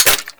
Heavy Pistol Mech C; Warframe; Weapons; Zariman Heavy Pistol

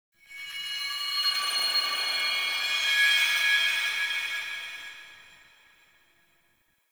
Glass Particles; Warframe; Ambience; Nokko Colony

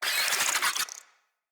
Infested Ashen Vocal Attack

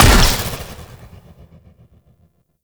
Operators Amp Barrel Part C Fire; Warframe; Amplifiers

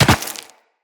Land Rock, Footsteps; Tenno

